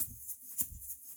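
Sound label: Music Pause; Beefense BeeMastered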